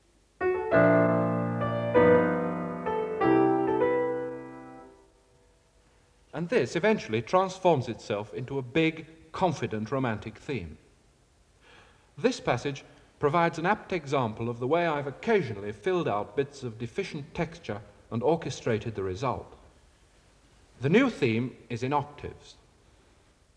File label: Music Example (Piano)